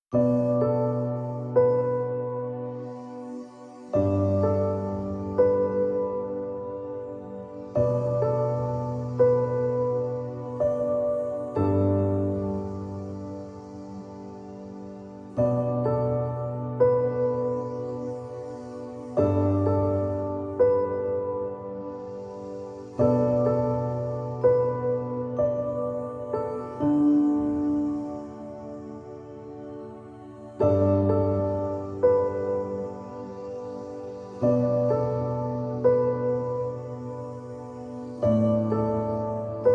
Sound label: Exam Study Classical Music Orchestra; Guitarra-Cuerda-Piano-Ambiente